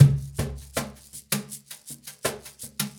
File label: Cajun, Percussion, Drums; Essential Percussion